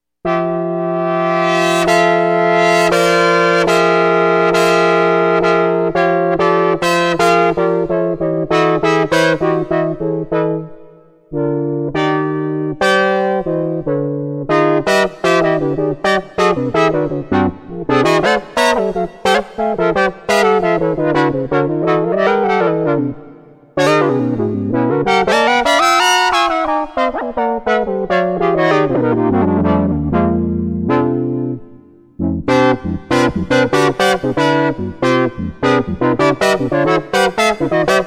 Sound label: chords